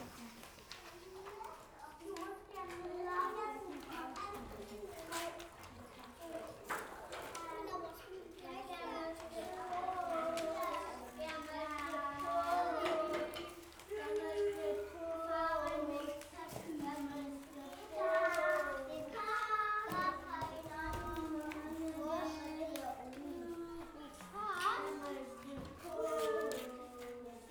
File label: Kindergarten Noise binaural